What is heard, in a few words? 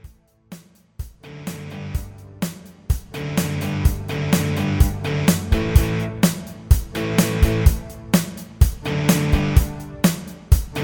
Instrumental With No Leads